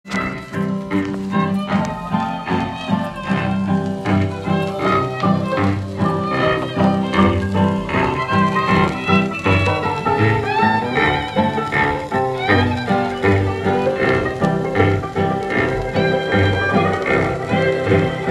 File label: Pig Meat Blues